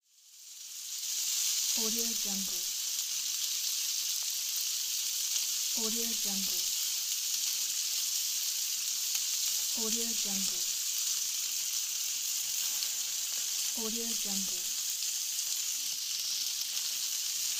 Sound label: grill; erhanbicici